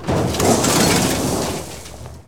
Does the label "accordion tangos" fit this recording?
No